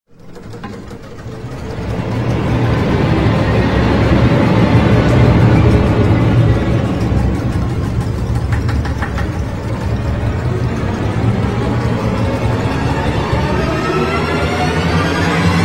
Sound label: Horror Strings